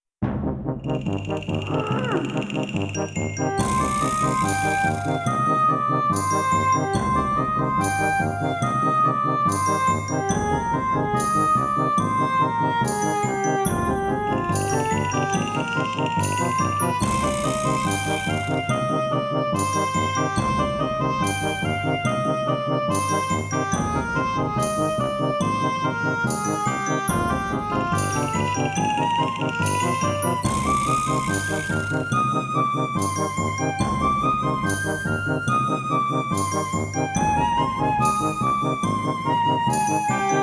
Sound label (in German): Musik Gänsehaut; Cornelia Funke erzählt von Bücherfressern